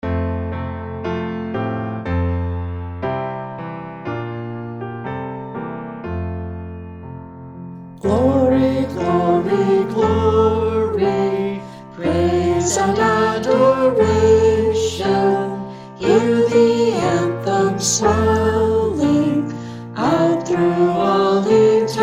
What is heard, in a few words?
sing; Hymns; Hymnal